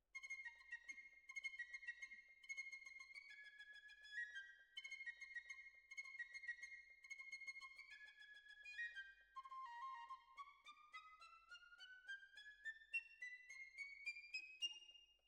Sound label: Oboe